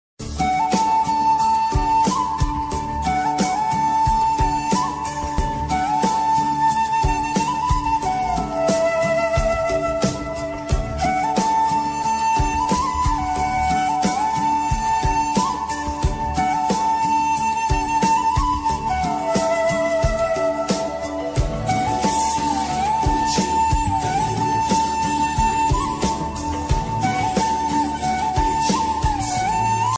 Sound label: flute ringtone instrumental ringtone